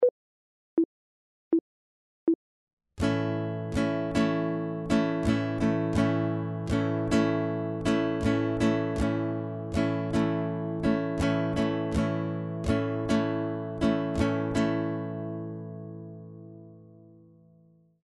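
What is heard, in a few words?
chord, guitar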